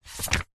household book thick hard back cookery page slide turn; zapsplat